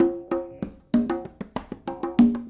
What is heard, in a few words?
Congas, 70s Analog Funk; Sonic Foundry (Sony Creative Software); What it Is!